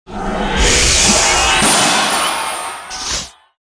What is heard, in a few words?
Music Frontend